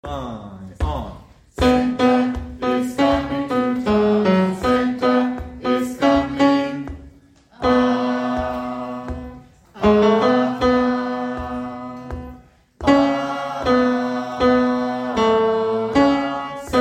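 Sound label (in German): Alt, gospel choir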